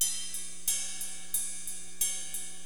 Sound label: RIDE LP, Hip Hop, Drums; LiveAct Pool 1